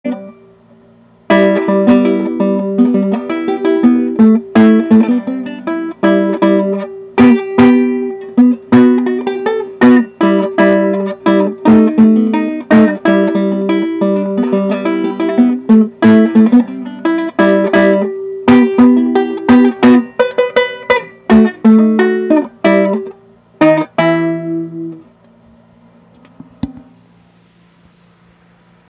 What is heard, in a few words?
banjo